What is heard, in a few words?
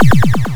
Joust Coin